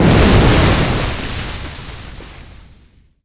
water splash

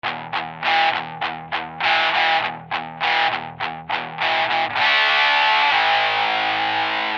El Guitar

